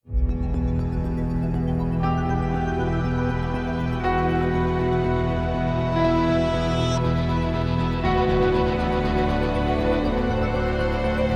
Devastation Ambient

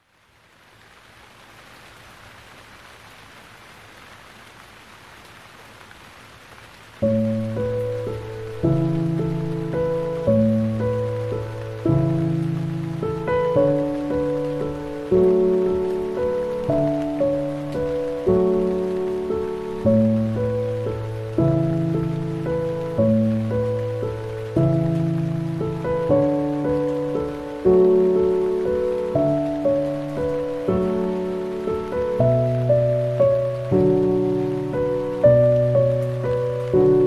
Dreamy Piano

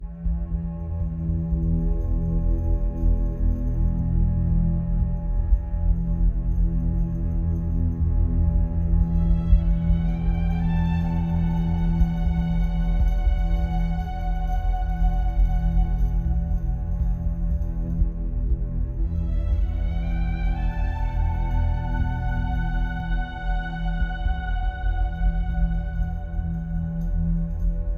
Isolation Ambient

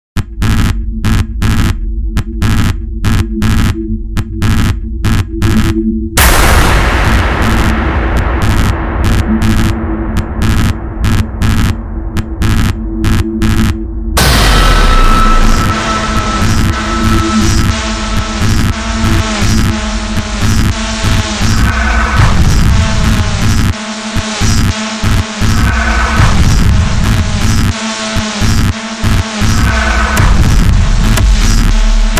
16th Pulse Sequence; Ninja Tracks

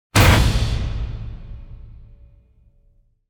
Enemy Strike; Gothic Storm Music

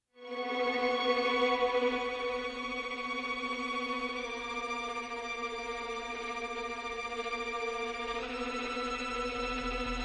Unease Ambient

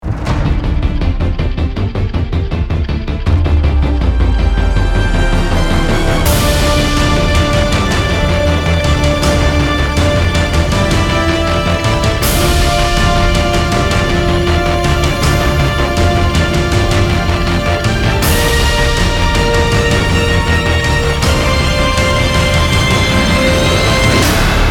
No Choir

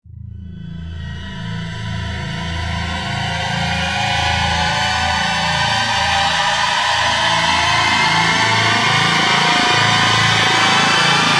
Piercing Rise Drone Version; Ninja Tracks

